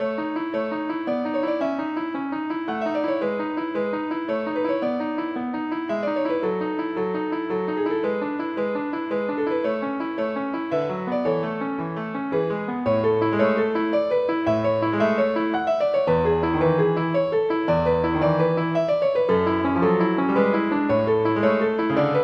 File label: Piano Sonata; Variation 2